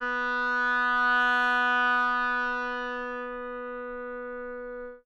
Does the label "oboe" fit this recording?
Yes